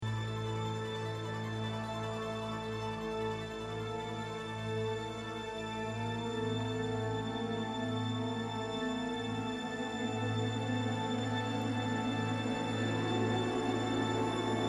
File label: Instrumental, Intermezzo